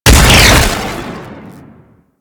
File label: Throwing Taser Sticky Bomb Explosion; Warframe; Tenno